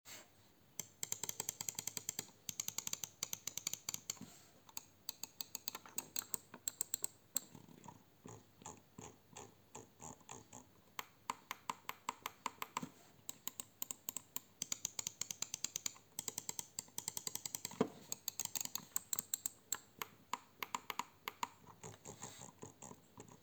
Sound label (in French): roccat burst pro enregistrement bruit; test peripheriques